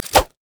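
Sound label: siegerider basic attack; minions; LoL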